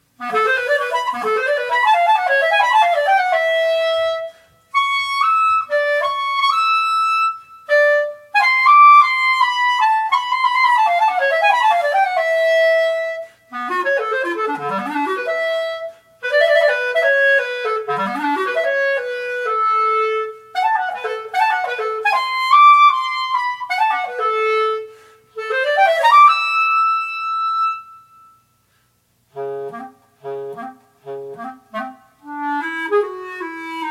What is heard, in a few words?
clarinet